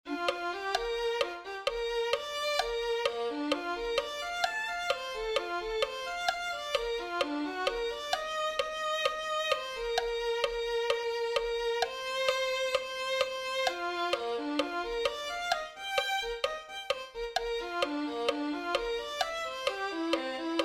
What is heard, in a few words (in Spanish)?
te alabare oh señor mi dios violin